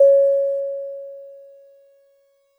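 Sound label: DreamLike DX100 C sharp 0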